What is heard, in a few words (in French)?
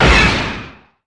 Faucon laser; vaisseaux